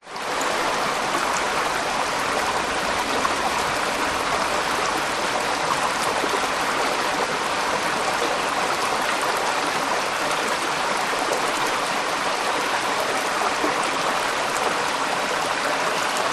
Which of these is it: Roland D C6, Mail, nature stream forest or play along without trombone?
nature stream forest